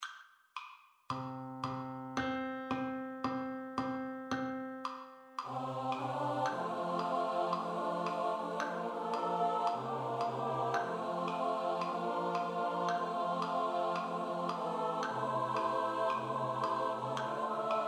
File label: SATB STUDY; We Can Get Things To Happen